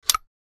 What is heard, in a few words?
Military hook clipping metal; Military Equipment